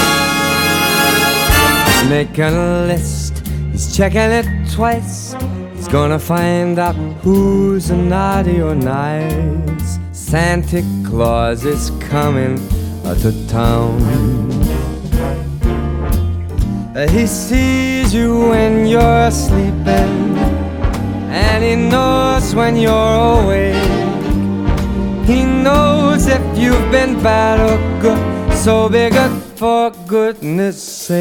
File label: vocal sample